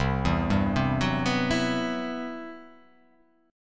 C Major Sharp 11th; CM#11 arpeggiated; JGuitar

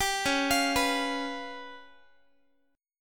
Db 7th Flat 5th, Guitar; Guitar-4A,4E,5A,5D,5Gsharp,6Csharp; Db7b5 arpeggiated

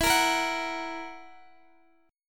E Suspended 2nd Flat 5th E down Guitar Open D

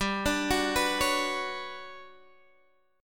G Major Sharp 11th, Guitar, Open G; chordshape; down

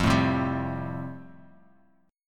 F Major Add 9th, Bass Standard; Fadd9 strummed; JGuitar

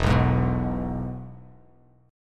E-Major E, Bass Standard; strummed; JGuitar

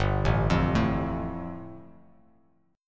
G 7th Suspended 2nd; arpeggiated; JGuitar

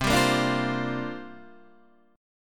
C 7th Suspended 2nd Suspended 4th, Guitar, Open D

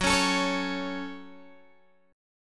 F sharp Minor, Guitar, Open G; chordshape; down

